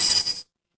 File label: ui chime